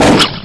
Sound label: grapple fire; lmctf